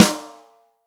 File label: ROCK SNARE CHROMATIC, Real Drum Kits